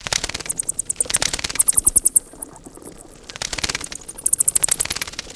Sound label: bats flaps; gesource; creatures